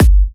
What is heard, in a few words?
Bassdrum